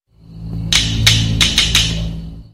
message

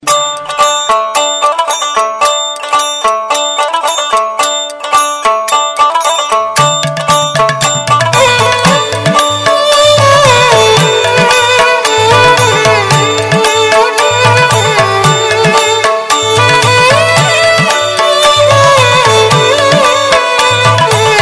Baul Dotara

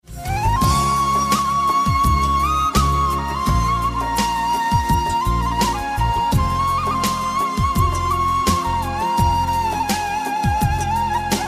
Turkish Ringtone

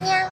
Meow; iPhone 5c

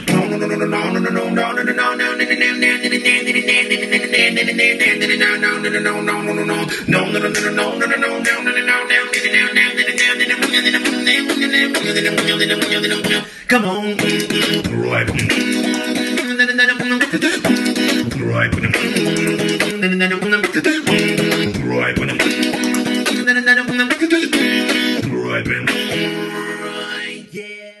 No no no Beatbox

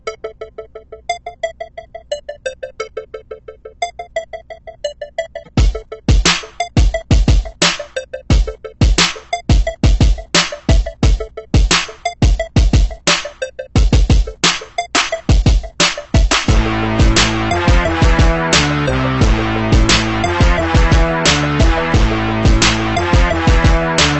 Strong Beat

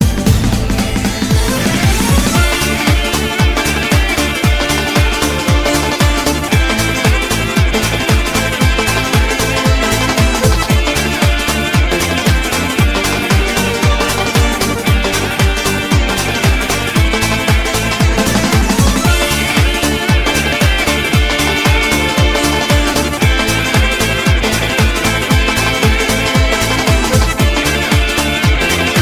Lezginka Rock Mix

